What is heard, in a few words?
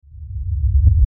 Lfe Rumble Short Reverse Swell; Blastwave; Sound Design